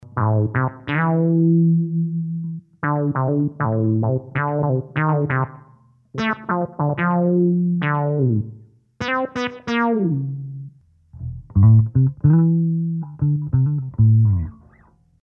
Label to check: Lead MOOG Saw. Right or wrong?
Right